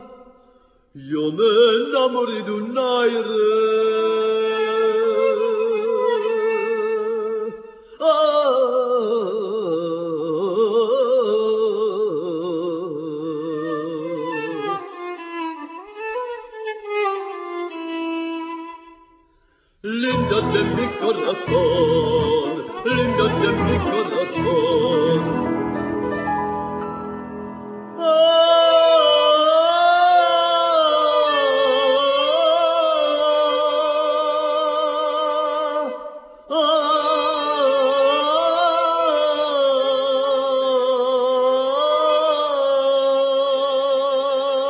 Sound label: nigun; Nigun:Zidovske Pisne